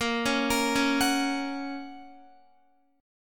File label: A sharp Minor Sharp 5th A sharp down Guitar; A#m#5 arpeggiated; JGuitar